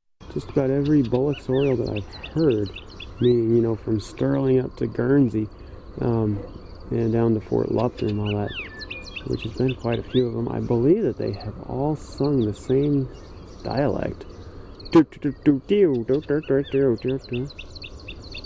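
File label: Bullock's Oriole song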